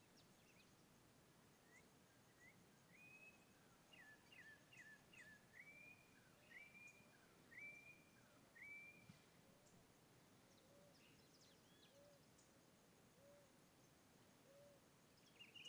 Passarinho cantando na mata dia; aves; Coleção Sonora do Cerrado

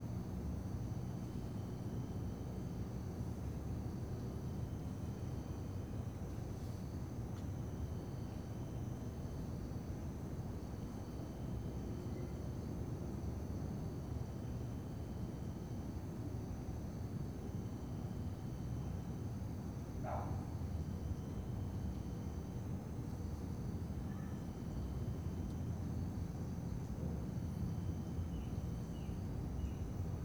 Ambiencia praca a noite com grilos, cachorro e transito bem longe; ambiencia urbana; Coleção Sonora do Cerrado

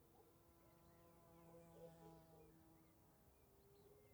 Mosca ou Inseto Grande dando Rasante no Microfone Estereo; Cerrado; insetos; colecao sonora do cerrado

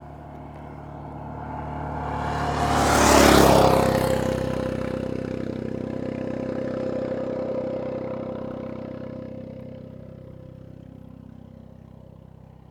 Estrada de sao jorge pouco movimento moto e carro passam rapido com vento; leve; Coleção Sonora do Cerrado

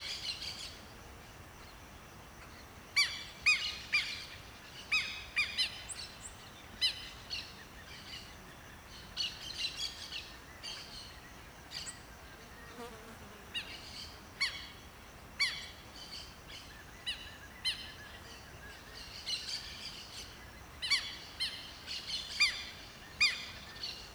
Quero quero unico com outros passaros no campo, grilo longe, passa uma mos; aves; arvore; mosca

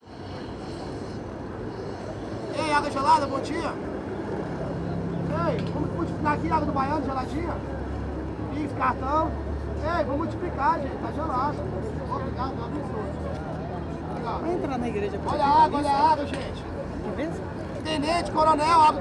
Vendendor de Agua em Meio a Multidao de Turistas

